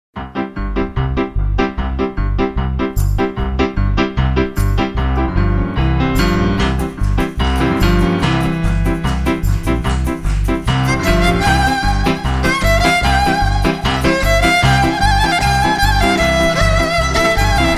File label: klezmer melody